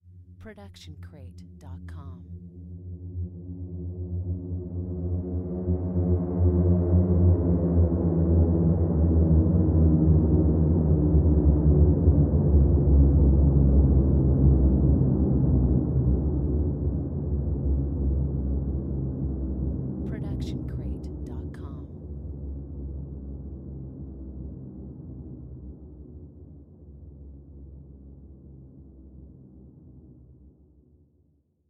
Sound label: intense string fall; soundscrate